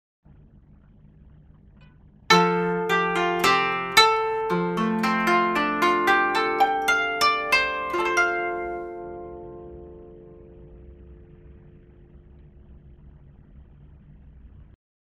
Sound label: koto